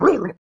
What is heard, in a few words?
weird; creature